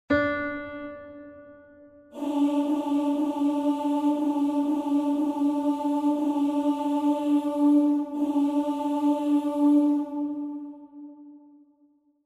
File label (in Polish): Ameen na 3 glosy Glos 2